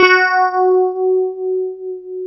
DS8 F#4, Korg; Back In Time Records; Korg Universe VOL-1

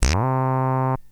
Synth hits and riffs

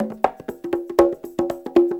CONGA LOOP, Perc Loops, Techno Trance

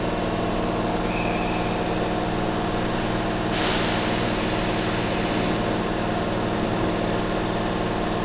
subway; AKAI S6000 CD-ROM - Volume 6; Transportation

